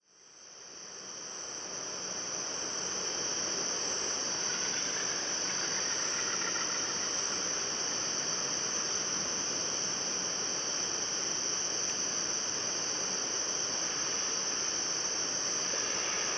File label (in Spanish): paisaje sonoro nocturno; isla del coco; chatham; historia natural